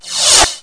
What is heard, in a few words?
REV CYMB; Groovy Bytes: Behind the Moon; DRM PERC